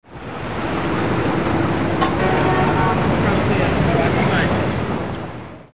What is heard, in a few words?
TRAFFIC, commercial